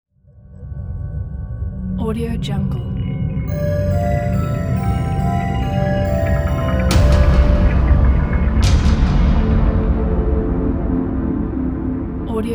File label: dark logo